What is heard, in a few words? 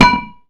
metal